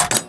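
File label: Gate, pinball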